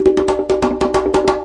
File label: conga roll